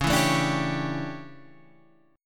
D Major 7th Suspended 2nd Suspended 4th C sharp, chord